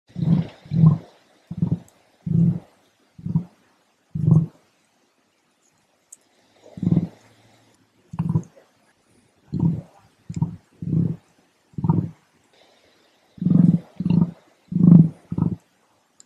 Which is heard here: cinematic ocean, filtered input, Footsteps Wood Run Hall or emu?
emu